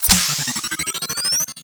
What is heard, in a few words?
Experimental Rifle Reload; Warframe; Weapons; Corpus